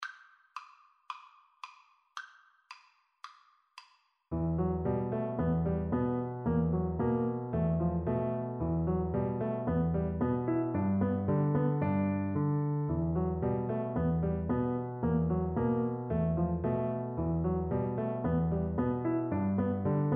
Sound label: mountain king, Bassoon